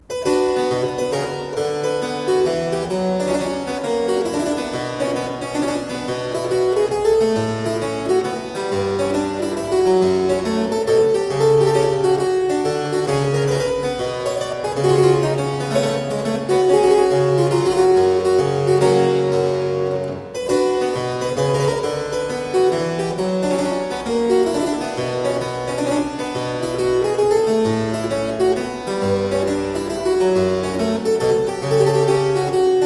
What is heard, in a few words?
Suite in C Minor - Courante, spoken, Keyboard Music; William Croft